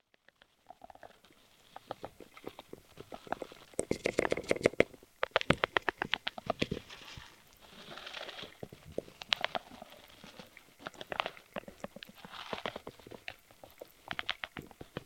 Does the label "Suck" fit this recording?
No